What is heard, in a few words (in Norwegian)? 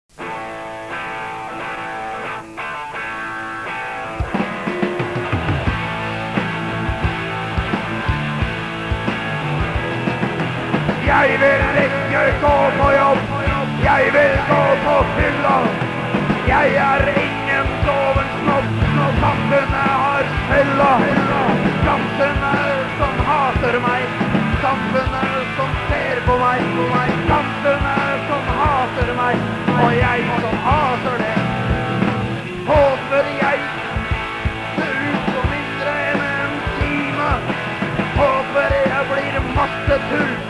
bo i container uffa konsert; Konsertopptak fra UFFA; live